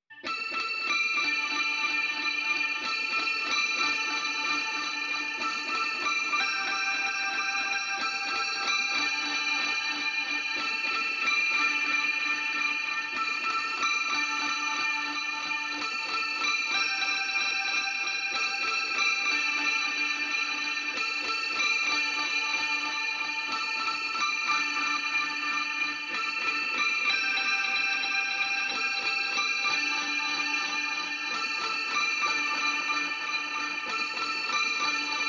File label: Bells